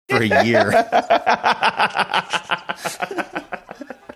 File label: laughing